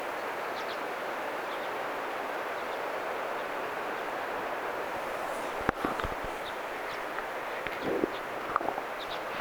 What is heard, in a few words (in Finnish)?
tuollainen urpiaisen aantely